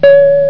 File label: tone